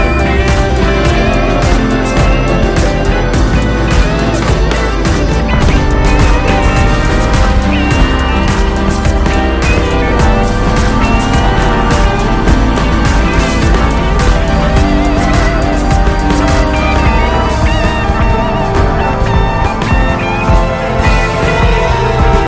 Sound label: music ringtone